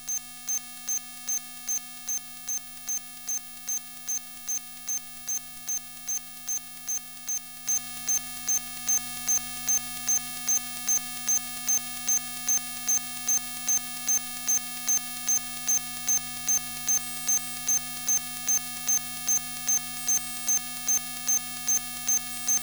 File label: EMF Wacom Intuos Pro tablet data sending; Soundholder